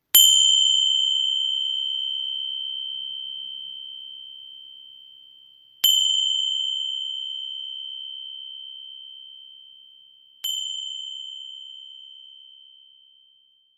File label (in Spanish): Tingsha dragones, Sonoterapia; 64cm; SI